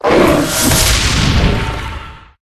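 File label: Rat buff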